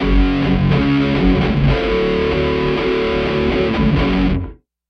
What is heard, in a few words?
guitar effect